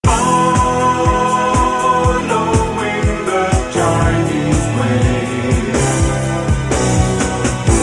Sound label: Chinese Way Jingle